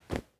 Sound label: ui drop cloth; Rust